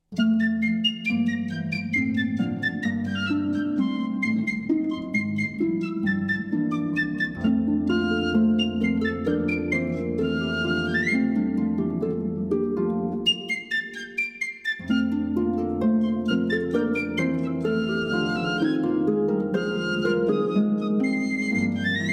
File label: Shepherds Pipe Carol